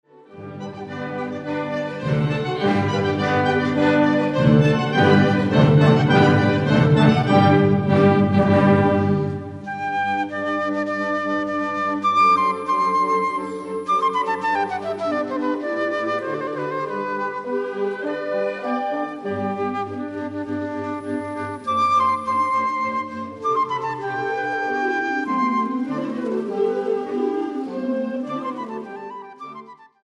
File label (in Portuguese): Concerto para Flauta